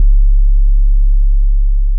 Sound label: Boom, Booms; Boom Nation; Ableton; Beat Tools